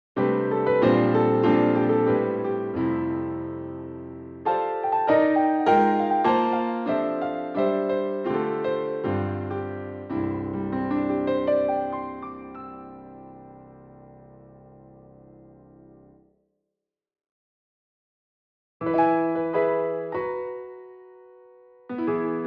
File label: Piano; Discovery Series Cuba